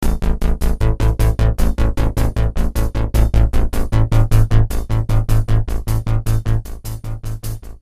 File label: loading loop